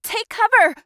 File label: Taking Damage; Realm Royale